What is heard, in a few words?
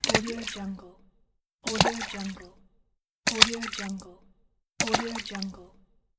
the water splashes; fx-library